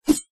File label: sword swing fast whoosh blade ring; zapsplat